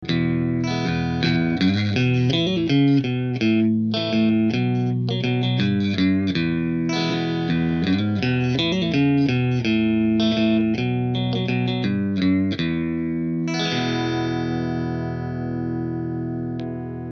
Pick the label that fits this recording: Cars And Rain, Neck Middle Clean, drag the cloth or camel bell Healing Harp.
Neck Middle Clean